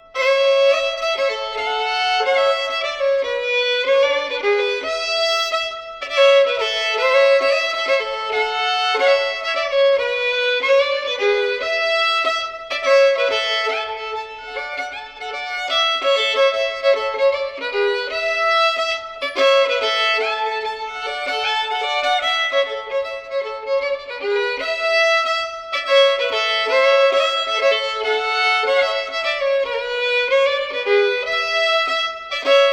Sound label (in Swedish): Shottis; fr Idre